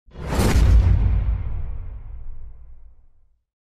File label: Iconic analog hit